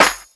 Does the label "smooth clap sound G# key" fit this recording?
Yes